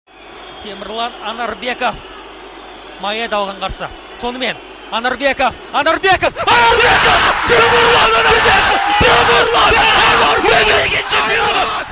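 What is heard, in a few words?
alarm clock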